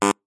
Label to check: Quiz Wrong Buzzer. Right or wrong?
Right